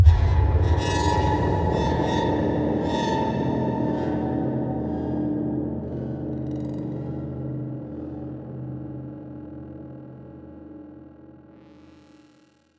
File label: Door Scare; soma gamerip 2015